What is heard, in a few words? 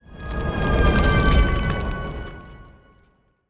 pro ship transport move; biogenesis